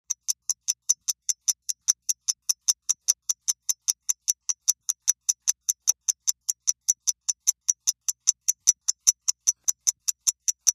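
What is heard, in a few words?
Stopwatch Tick Up Close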